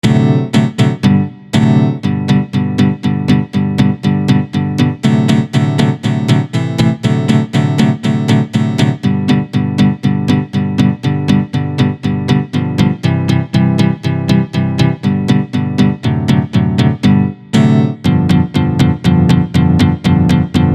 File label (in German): Alpine Volksmusik Gitarre